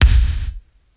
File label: long tail reverb kick sample a sharp key, kick drum single shot tuned to the A# note